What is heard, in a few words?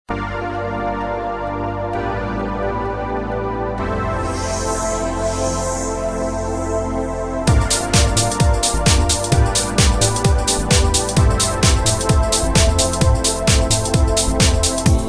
strings pads